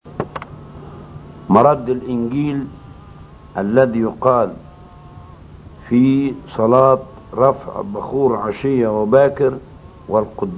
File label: Gospel Response; Alhan Maleyka; Angel Rafael